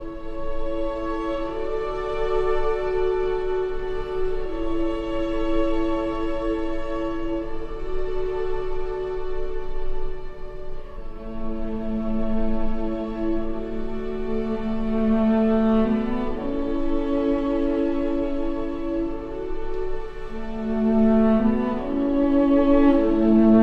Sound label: for cello and strings; Archi di Santa Cecilia